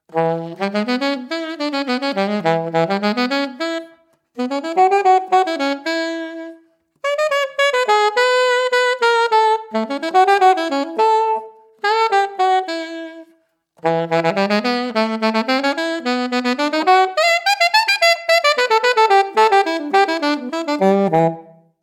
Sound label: Selmer solo; AXOS